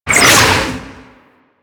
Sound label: Pirate Cannon Projectile Whistle; Warframe; Warframes